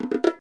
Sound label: Rain Forest